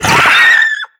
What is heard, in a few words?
headcrab reviver vox run start; creatures